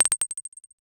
8 bit tile drop sound; game audio

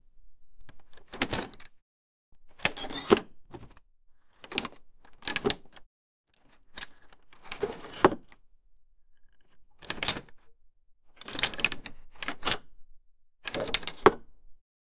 chest open wooden creak

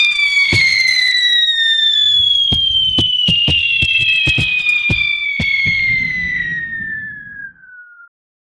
firework sound effects

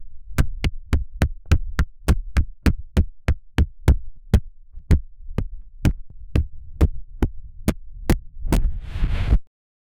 beating and punching; close combat

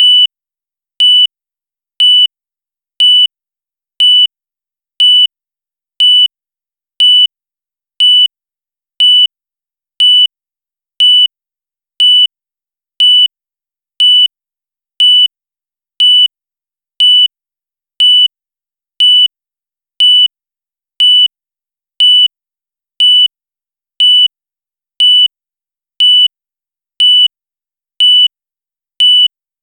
bomb countdown; fps

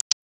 a 3d spatial button click; depth

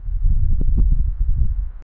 woosh, drone

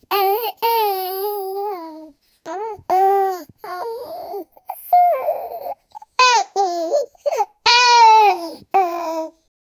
king kong baby fearful, ape

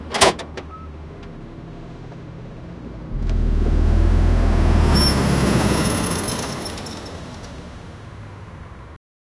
truck suddenly stop

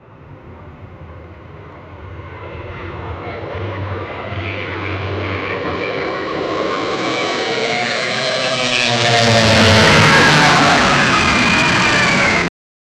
sound of a plane crashing; disasters

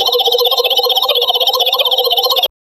slot machine, gaming

